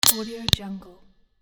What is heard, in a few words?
click mouse